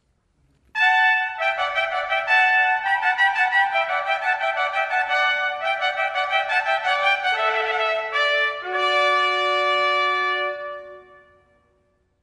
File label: Fanfare for Two Trumpets; Classical